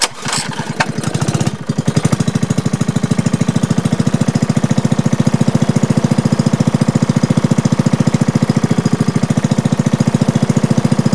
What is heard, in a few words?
gokart start loop; vehicles; sligwolf